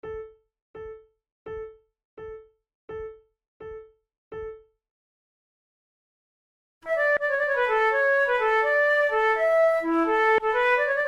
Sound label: two flutes gigue a la maniere francoise; Monteclair; 6 concerts; flute tunes